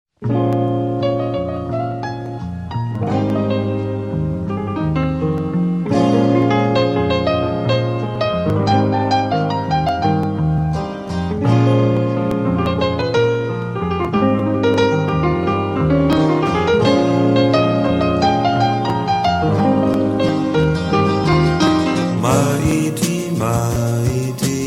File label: Simple Song